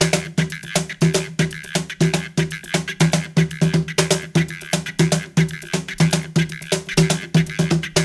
120, Box Brush; Spectrasonics - Supreme Beats - World Dance

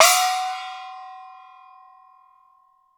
GCO HI, orchestra hits; Best Service; Peter Siedlaczek's Advanced Orchestra

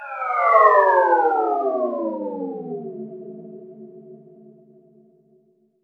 Atmosphere; Roland BK-5

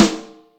RIM watts, Yamaha DTXpress Acoustic Snare

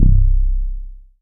Rubber Bass; Best Service Gigapack 2

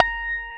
GUI HRMFLA, Acoustic, Roland

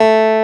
CLAVINET A3; E-MU Formula 4000 Series Vol. 3; Analog Odyssey; VK Instruments

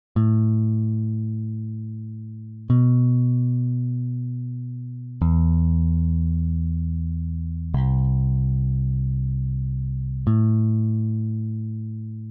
Bass, 95BPM Amin; 99Sounds; Monosounds; Music Loops; PANDORA BOX!; Romance

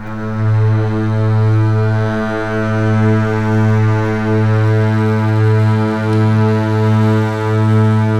LEG MF L, The Ultimate String Collection; East West

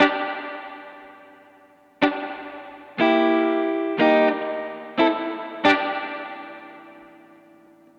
Guitar, 70s Analog Funk

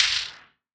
Wider Clap

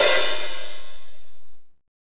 KOMMERZDRUMS

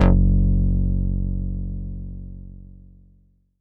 Fatboy Tight f#2, Korg Poly-800

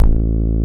SPIKE BASS; Masterbits; Dance Trax

